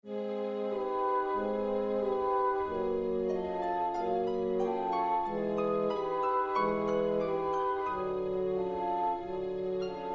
Creepy Transition; Zyxx; Season 1

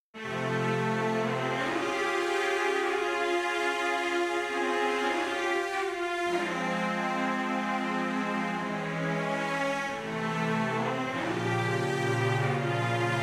Romantic Interlude; Season 1; Mission To Zyxx

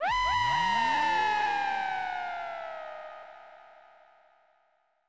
Scream; Ozzie; Chrono Trigger

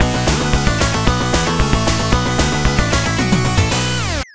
Game Over; TechnoSoft; THUNDER FORCE III & AC

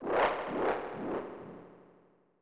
Laugh; Ozzie; Chrono Trigger

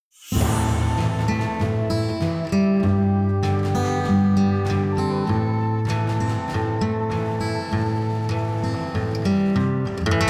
Short Western Transition; Mission To Zyxx

